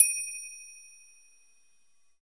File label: BEL TRI OP, TRIANGLES